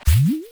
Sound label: Magic Wand Magic Hits Ice Ball; New Super Mario Bros. Wii